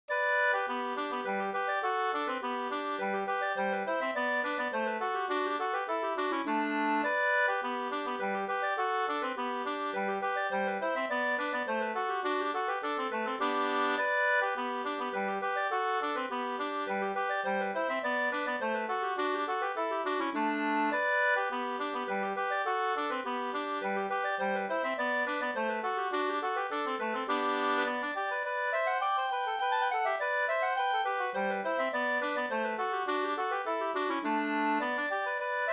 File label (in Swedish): polska, 2st; Byss-Kalle